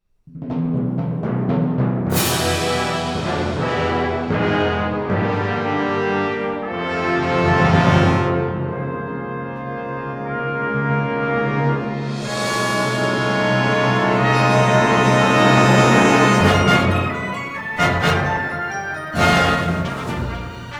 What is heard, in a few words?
Orchestral Theme; Angry Birds Space